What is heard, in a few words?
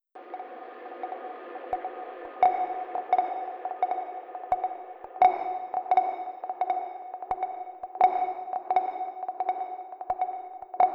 Echo SFX